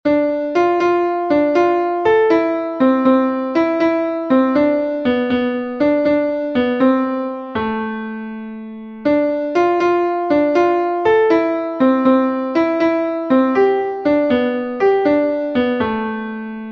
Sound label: mazurka; olivier